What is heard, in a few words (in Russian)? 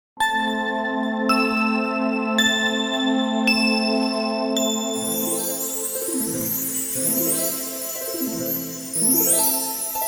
ФОН СКАЗКИ